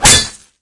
machete impact world, weapons; Left 4 Dead 2

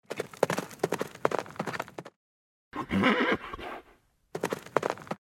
Horse; Age of Empires III

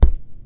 ceiling tile impact soft; physics; Garry's Mod; hl2

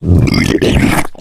male boomer alert; voice; Left 4 Dead 2

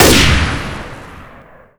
hunting rifle fire incendiary; weapons, gunfire

